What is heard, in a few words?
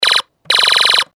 toy gunz